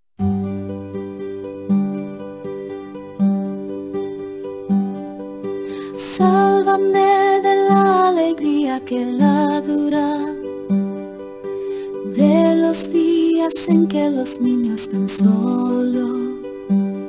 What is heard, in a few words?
music